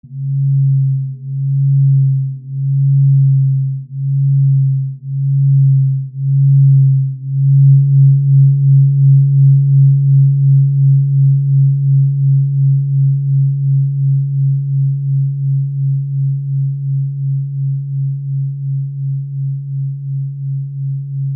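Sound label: Antique Pentatonic Fundamental Set Fundamental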